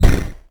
stone golem move; Crypt of the NecroDancer

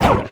blues piece death; Crypt of the NecroDancer

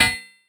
hammer; hephaestus; Crypt of the NecroDancer

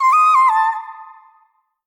blues queen sing; Crypt of the NecroDancer

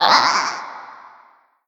shopkeep death; Crypt of the NecroDancer

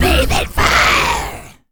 deathmetal fireball; Crypt of the NecroDancer

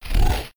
wallmonster chase; Crypt of the NecroDancer

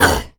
deathmetal hit; Crypt of the NecroDancer

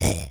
goblin toward; Crypt of the NecroDancer

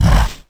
golem stone hit; Crypt of the NecroDancer

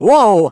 ice slip; Crypt of the NecroDancer

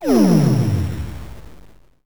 spell descend; Crypt of the NecroDancer

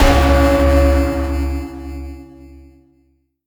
deadringer bell strike; Crypt of the NecroDancer

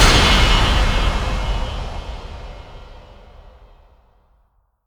bell break; deadringer; Crypt of the NecroDancer

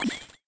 pickup gold; Crypt of the NecroDancer

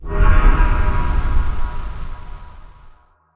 shrine destroy; Crypt of the NecroDancer

